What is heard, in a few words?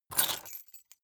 key ring large grab table hard